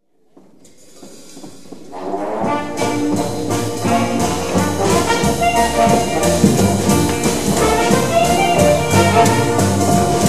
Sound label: Jazz Band